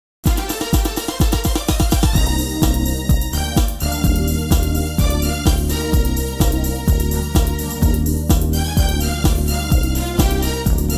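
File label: Bb live track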